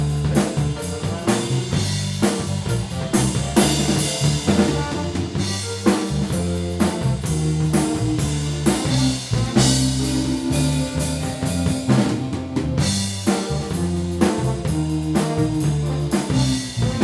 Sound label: fill